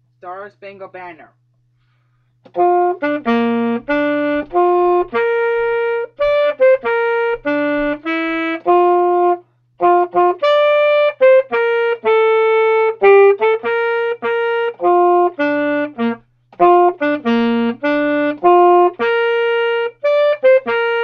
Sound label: Saxophone